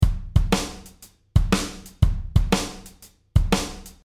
shuffle

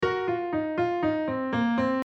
double min pen

